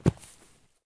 landing grass low; Escape from Tarkov

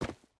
footstep boots dirt run; Rust

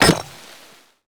connect concrete

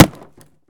plastic; Escape from Tarkov

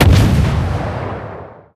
ambient port; World Of Warships; port3d

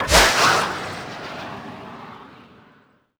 turret aa launch stereo

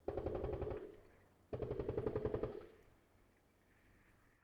ambient shot mono

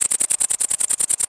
crickets; World of Tanks Blitz; pliego

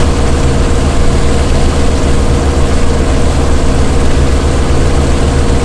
bearcat cockpit, engines; War Thunder

